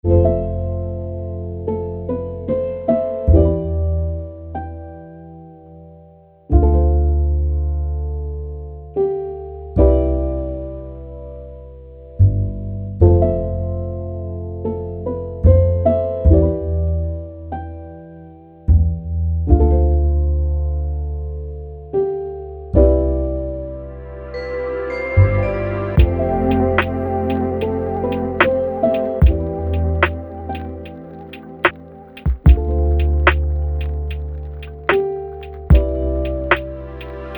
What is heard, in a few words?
Sad Lo-Fi, Village Beat Street